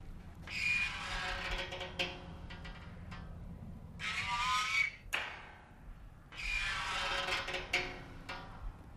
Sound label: Spring Loop From Gat, gate & big rolling